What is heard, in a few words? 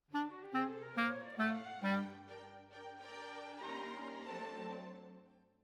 Clarinet